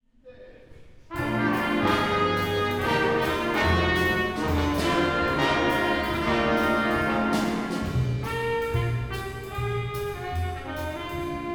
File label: jazz ensemble